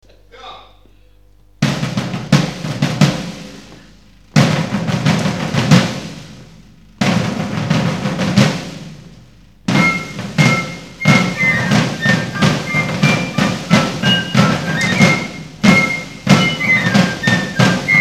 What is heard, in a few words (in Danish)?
Piccolo march